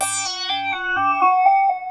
Psycho Bells; Sound FX

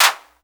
Clap, Korg KPR-77; Drum Samples

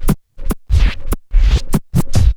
scra, Scratches; The Tables

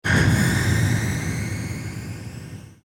sound startup; Nokia Ringtones; 6270; 6270 WAP; Football

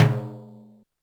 Yamaha RM 50 Toms

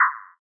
bombo, kicks, Hardcore

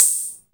Hat Open, Drum Samples Roland CompuRhythm-78

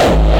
bombo, kicks, Hardcore

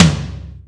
Tom H, Yamaha RY-30 Toms; Drum Samples

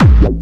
crap bass

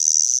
78 guiro, Roland MC-303, Percussion; Drum Samples

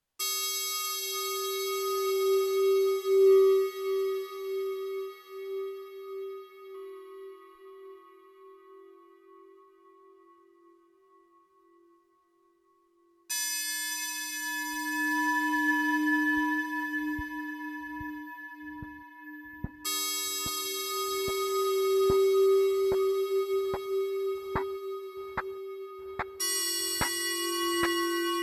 Jam with bells on

